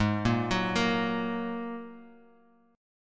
G sharp 7th Suspended 2nd G sharp Guitar Half Step Down